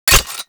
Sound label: Revolver Rifle Low Ammo Warning; Warframe; Tenno